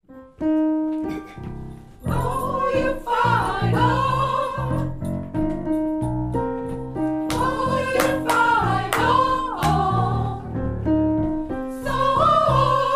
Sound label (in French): soprano, gospel; refrain